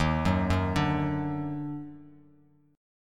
Eb Minor Major 7th Eb down Bass Standard, chord; EbmM7; arpeggiated; JGuitar

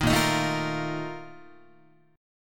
A sharp Major 7th Suspended 4th, Guitar; strummed

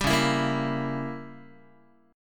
Db Diminished 7th Db down Guitar; strummed; JGuitar

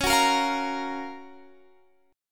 F sharp Major 7th

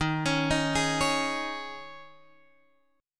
D sharp 7th Suspended 4th D sharp, Guitar Open G

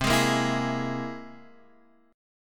C Augmented 7th, Guitar, Half Step Down

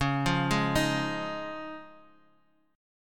C sharp Major Add 9th

